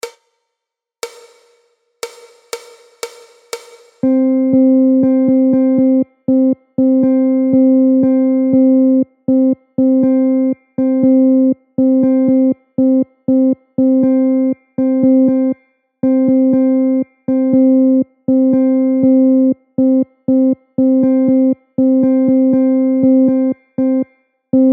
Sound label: 8th rhythm